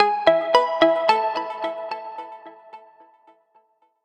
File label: Digital, ringtones; China Telecom